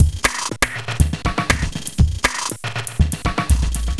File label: Time Stretch Beat; Sonic Foundry (Sony Creative Software); Myths of Technology; Composite Beats